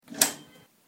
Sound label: door opening; ringtones; sms